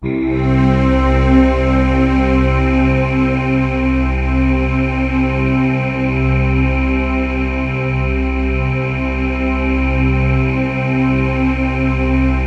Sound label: STRINGED; MORPHEUS